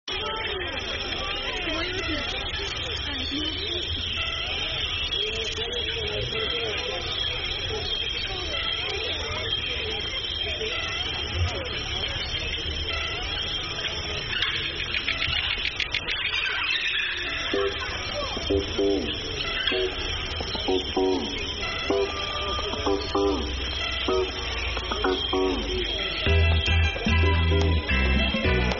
Confianza Divertida Neutral Fresca

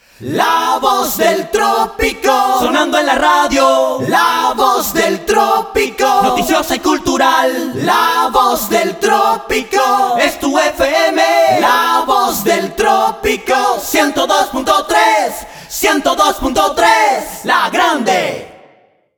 Solo Voces; La Voz Del Tropico

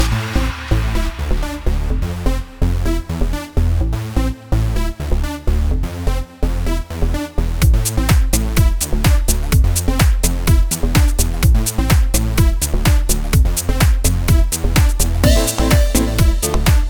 jingle musica; La Parrilla del tío jessy

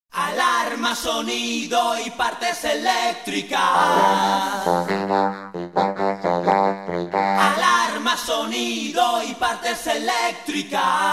voces; Auto accesorios milan

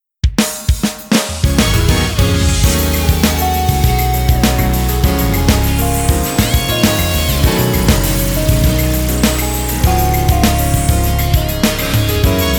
Radio Pop Pista; BanicityRadio Pop

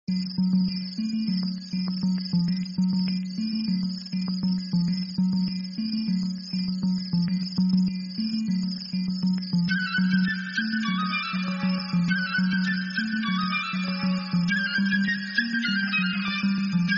Antigua Ironica Pueblo Etnica Natural transitoria

